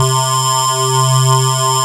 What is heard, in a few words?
JU PULSE; Pro-Rec - Synthsonic; JV ANALOG